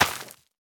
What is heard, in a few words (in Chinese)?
收获作物声